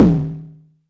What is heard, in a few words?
Tom High